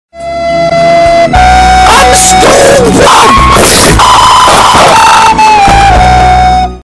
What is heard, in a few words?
sneeze